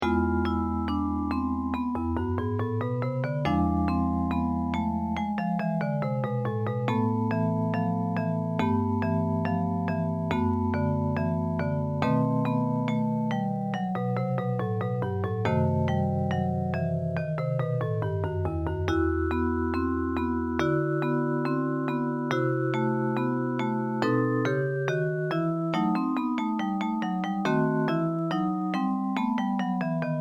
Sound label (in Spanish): estudios basicos staccato